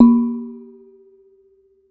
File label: Kethuk, Gamelan